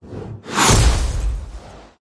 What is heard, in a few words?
whoosh; Audio Mangler